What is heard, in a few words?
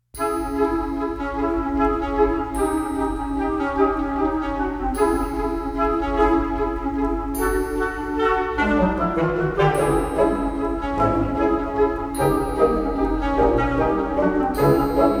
Ghost Waltz; 예능 Tension